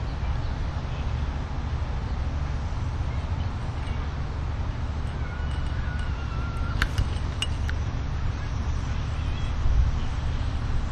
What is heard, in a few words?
scream or eagle cry